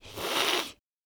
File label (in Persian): صدای هیس کردن گربه; انواع صدای گربه و معنای آن